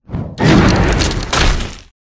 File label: Yeti; Pet